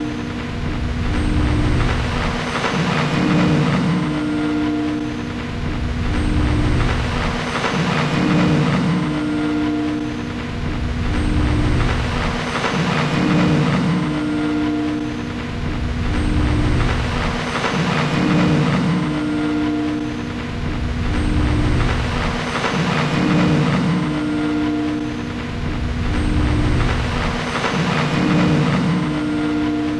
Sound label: RINGTONE